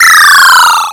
RUN SFX

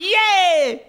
jump; impact

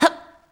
jump; impact

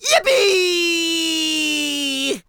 fly vox; Rayman

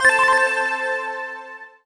COLLECT SUPER; impact

